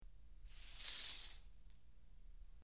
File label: Light Sweeping Up Gla, cleaning, sweeping